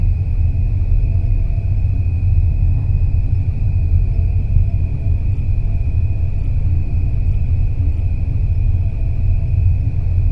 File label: the humming of machinery; control panel